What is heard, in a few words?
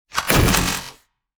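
tripmine explosion digital; weapons; Half Life Alyx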